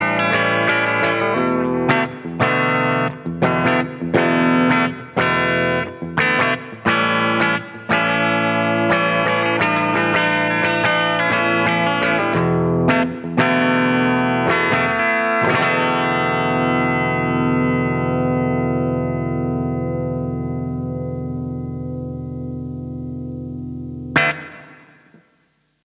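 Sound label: heavy comp, Ross compressor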